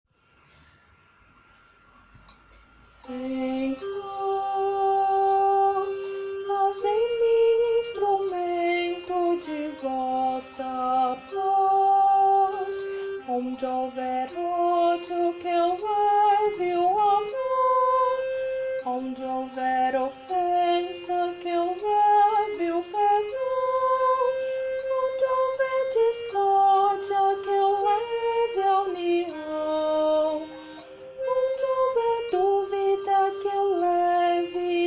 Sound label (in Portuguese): oracao a sao francisco soprano